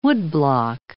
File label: woodblock